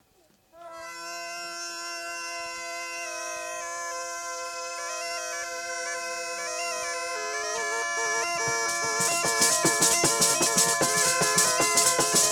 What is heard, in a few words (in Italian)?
canto alla zampogna; ninuzzu vai a la fera; Cardeto; Mammola; Cinquefrondi